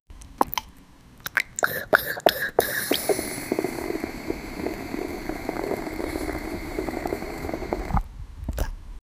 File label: a woman drinking orange j, juice